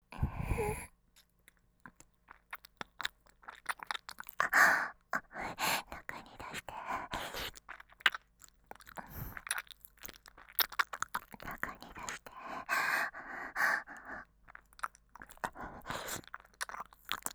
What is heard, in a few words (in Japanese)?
中に出して 耳舐め喘ぎ; DL製作班; ハーレムオナサポ 4人のメイドがご主人様のオナニーをお手伝いするバイノーラル; 深雪